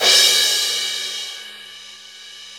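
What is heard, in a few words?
CYM CRA, crash cymbal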